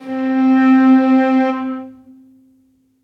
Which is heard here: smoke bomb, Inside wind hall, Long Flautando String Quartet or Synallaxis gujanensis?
Long Flautando String Quartet